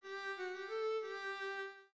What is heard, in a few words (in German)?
Rhythmus Ubungen; Notenlesen lernen